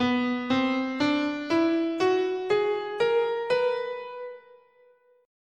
B Neapolitan Major

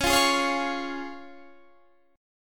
C sharp Major

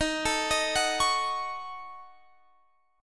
Eb Minor 7th Flat 5th

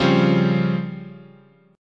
C sharp Major 7th Flat 5th, guitar chord

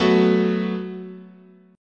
Gb Minor Double Flat 5th, chord, guitar

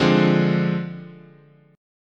D Minor 7th Flat 5th

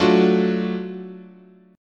Gb Minor Major 7th Sharp 5th, chord, guitar

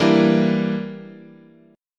E Minor 7th Flat 5th, chord

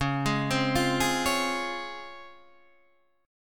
C# Major 7th, C#

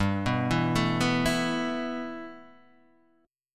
G 6th Add 9th G, chord

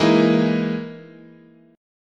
E Major 7th Flat 5th

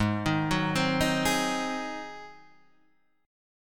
G# 7th

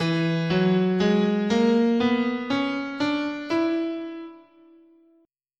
E Lydian Dominant, scale

